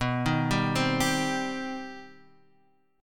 C Minor Major 7th B

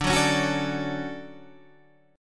D Major 7th, chord

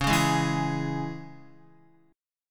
Db Minor, Guitar; Dbm strummed; x,4,1,1,4,4; JGuitar

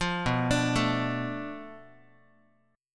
B Minor 7th Flat 5th, Guitar; Bm7b5 arpeggiated; 4A,4E,5A,5D,5G#,6C#; 8,7,5,7,x,x; JGuitar

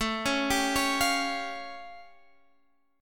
A Augmented 7th A Guitar Half Step Down

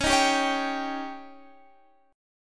Db Suspended 2nd Flat 5th, Guitar Standard; strummed; JGuitar

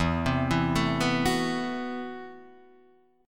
A Minor 6th Add 9th E, Chord

